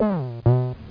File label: Food; Blake Stone